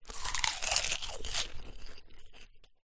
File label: human eat popcorn